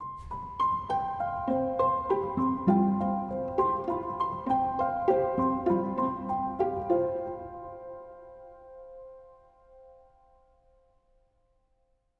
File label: rainforest bgm low head 100bpm 12beats CMajFMaj